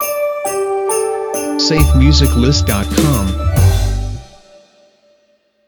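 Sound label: Short Christmas Jingle Intro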